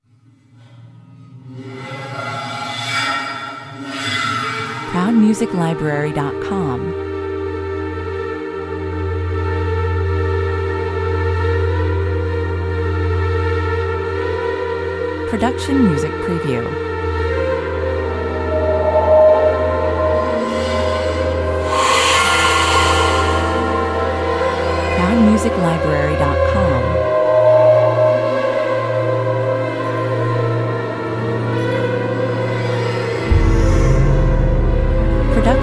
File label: horror 131 bpm